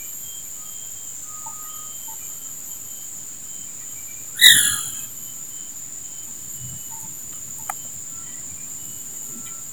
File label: Lariscus insignis, Three-striped Ground Squirrel; Ulu Chepor, Perak, Peninsular Malays